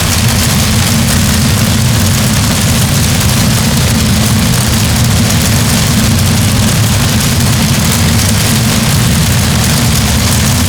ambience disaster hard fire

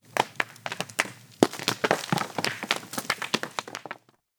rocks fall

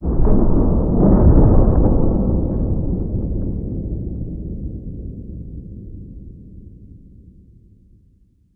misc amb

